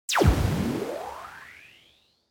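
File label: Laser Fire and Recharge; sfx; castelloe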